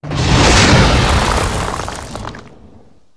wind land

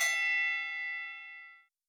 ding medium

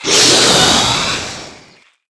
spider monster striking

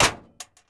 locker door close; doors; bms objects

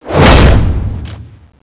charge slash; tfa cso; holy sword

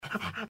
gecko panting; npc

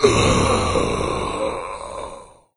death; doom3; commando

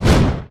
kick

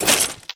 fidget move

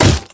step run

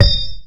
concrete

